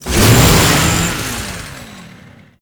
Saw Melee Swing; weapons; Warframe; Grn Ghoul Saw Player; Kuva Ghoul Saw

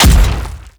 Thumper Bot Step Heavy; Warframe; Grineer; Movement; Steps

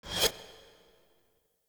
UI Focus Hover

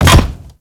Grineer Land Metal, Footsteps; Enemies; Warframe

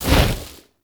Boss Fireball; Warframe; Caliber Chicks

